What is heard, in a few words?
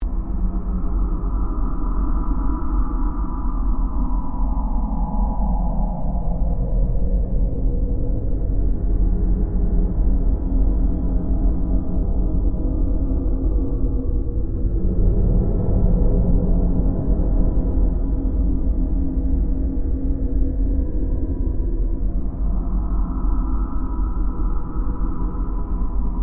Created Drone Long, Drone Sound Effects; sound building blocks created through manipulation of raw material